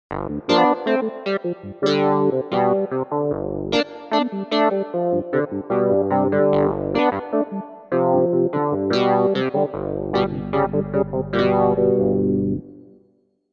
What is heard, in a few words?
Combo, v-synth